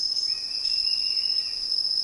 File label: Sirystes sibilator